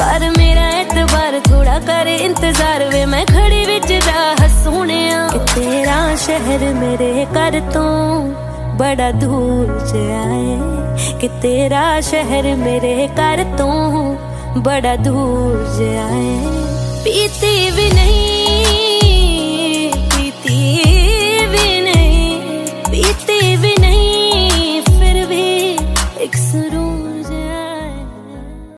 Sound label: bollywood song ringtone